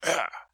Damage

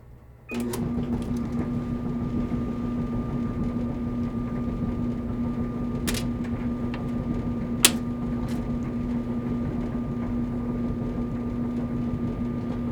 Clothes Dryer

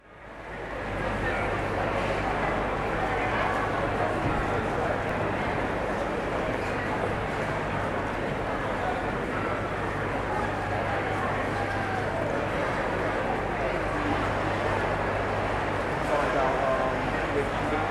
Kings Cross Platform

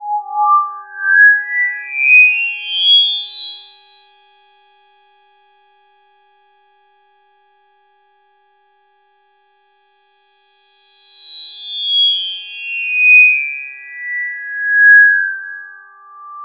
Cinematic Sweeping

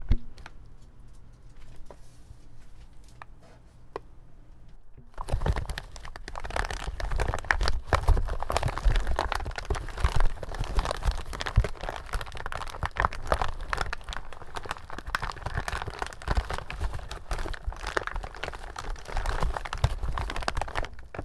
Rocks Falling No Reverb Foley Sound

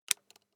Switch Light

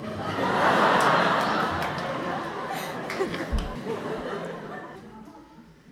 Laugh

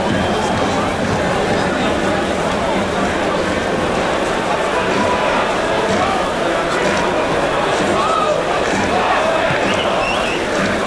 Stadium Atmosphere

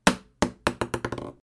Plastic Bouncing Ball

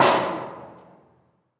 Metal perc

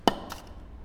Plastic Cup

